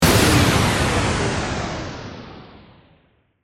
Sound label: Hit Power Down